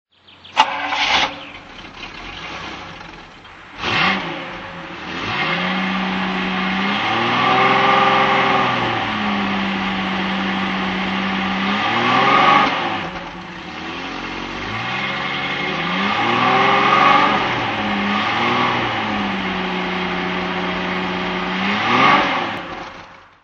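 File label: daimler double six lwb throttle; Daimler Double Six 'Vanden Plas' LWB, 1973; Engine sound; Engine sound driving; V3D/ ClassicarImages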